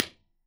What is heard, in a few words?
vox intern bright, AC30, IRs